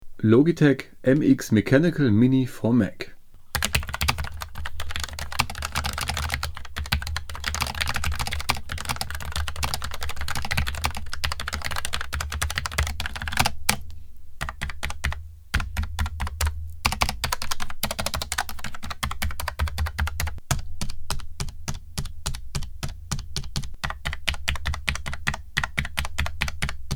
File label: Soundcheck Logitech mini mechanic